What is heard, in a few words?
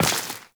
Step Flesh, Footsteps, Walk; Warframe; Characters; Tenno